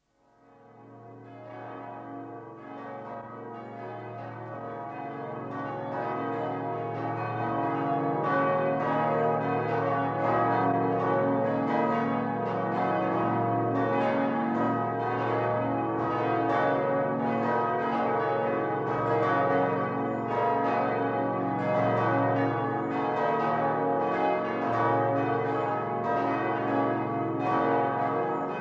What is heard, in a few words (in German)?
glocken Siegburg; Abteikirche; Glocken-Anlage